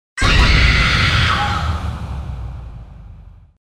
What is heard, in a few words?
VOICE Scream; Eternal Darkness Requiem